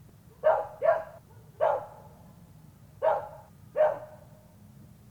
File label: dogs barking